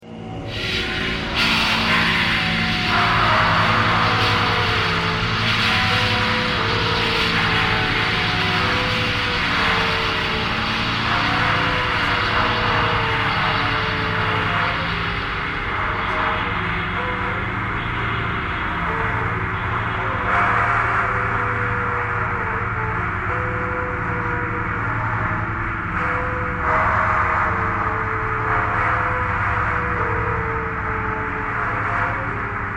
thread pentatonic

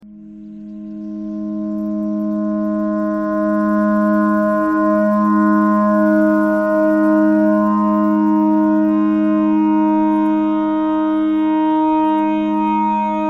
clarinet notes e clarinet thread noise

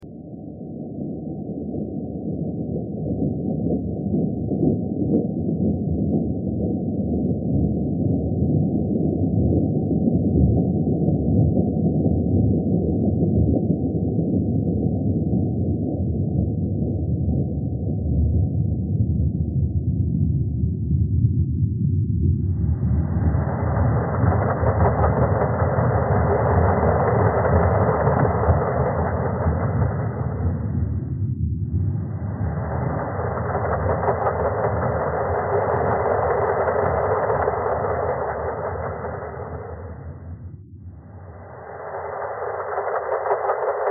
thread simple

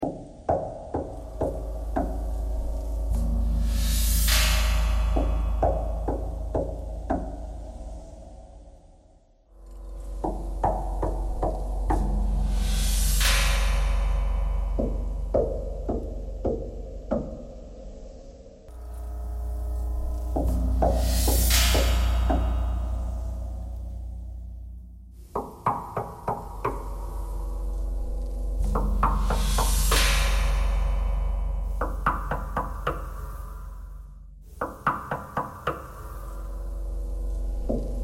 knocking thread noise

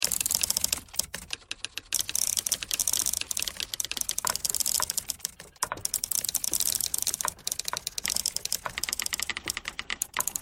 typewriter thread all

